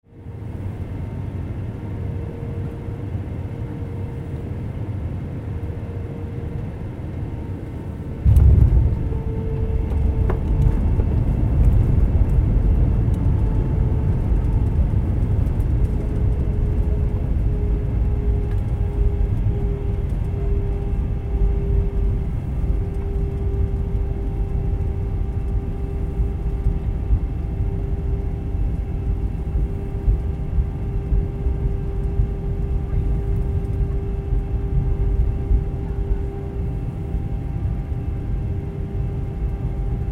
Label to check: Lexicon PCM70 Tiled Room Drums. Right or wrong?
Wrong